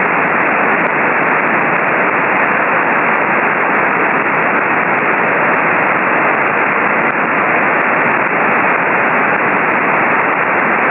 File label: grail-B-xband